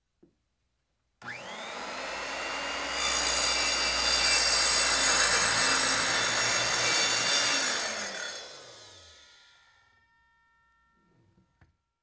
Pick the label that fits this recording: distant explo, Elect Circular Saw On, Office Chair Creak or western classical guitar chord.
Elect Circular Saw On